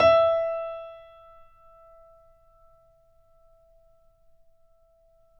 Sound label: Mf E4, Grand Piano; AKAI S6000 CD-ROM - Volume 2; Grand Piano 256M